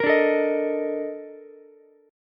A sharp Major 11th Ukulele, chord; A#M11; strummed; JGuitar

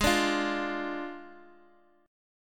D Diminished Ab

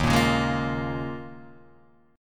Eb 9th Suspended 4th, Guitar, Half Step Down

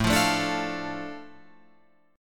A Suspended 4th Sharp 5th, Guitar Open F; Asus4#5; strummed; 9,12,9,9,12,12

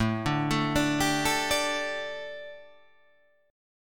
A 7th Suspended 4th, chord

